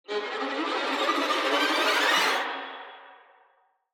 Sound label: Cartoon violin suspense